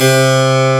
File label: HARPSICH